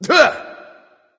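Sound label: non-verbal clear throat; smite; voicepack; houyi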